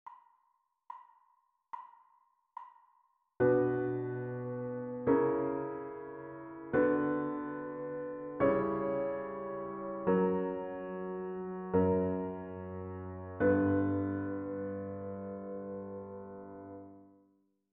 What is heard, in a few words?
harmonic dictation; ear training